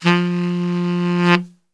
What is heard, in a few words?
F 1 SAX, Brass Super Section; Best Service